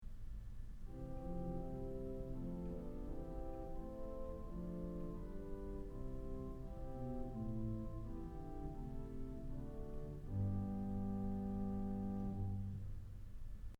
Gemshorn, organ stops; Kellogg Auditorium

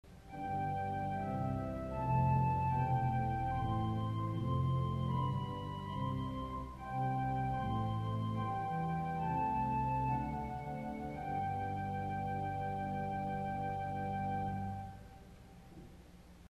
Flute Triangulaire 4 St Anne Solo, organ stop; CulverAcademy

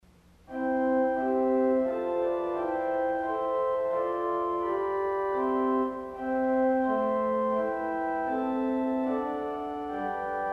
Harmonic Flute 4 St Anne, organ stop; harmonic from f#2; Möller 1951; CulverAcademy

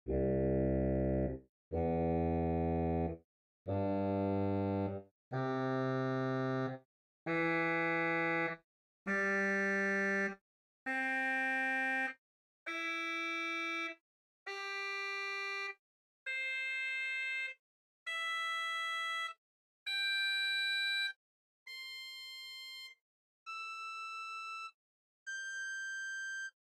Hautbois, organ; Pos; arp; Romanswiller

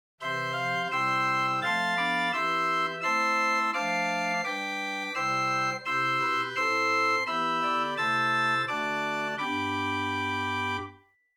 Gedackt Prinzipal, Chamber Organ

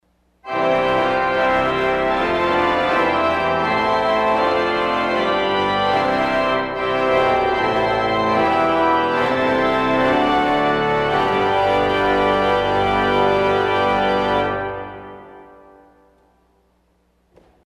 St Anne Full Organ; CulverAcademy

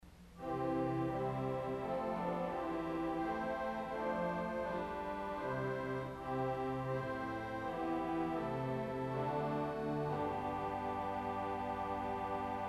Voix Celeste St Anne, organ

